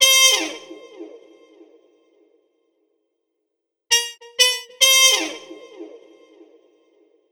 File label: Muted Delay, духовые